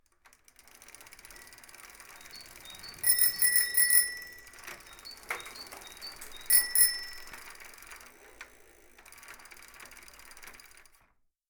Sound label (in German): Fahrradfahren